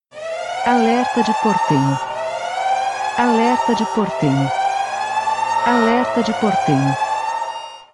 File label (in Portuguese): alerta do porteno